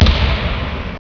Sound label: Case Close